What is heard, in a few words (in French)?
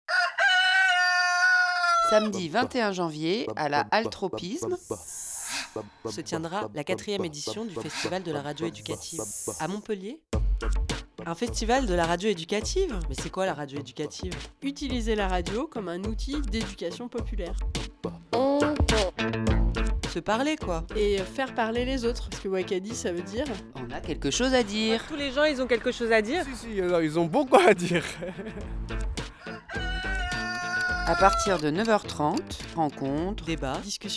Jingle; Festival radio educative 2023